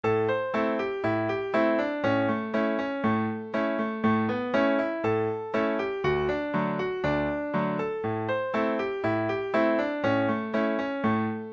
old bell cow, session tune

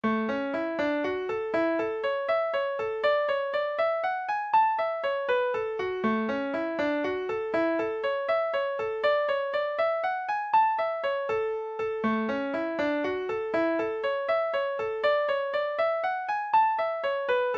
up bow jig; irish

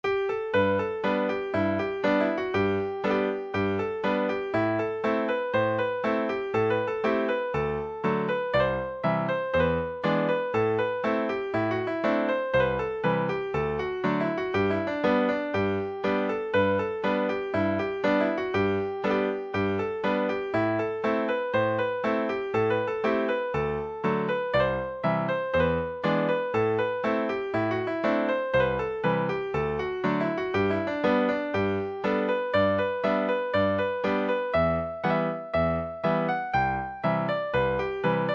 hornpipe; bantry bay

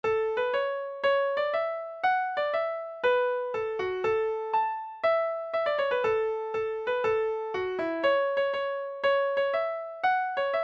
violin pipe and harp; scottish

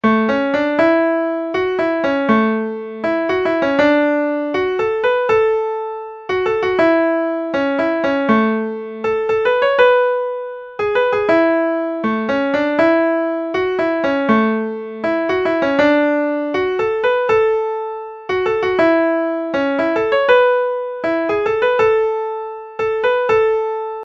bluegrass valley waltz

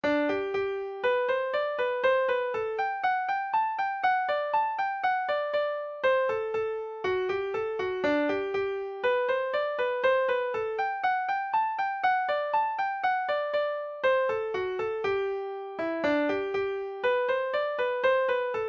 flute; rices

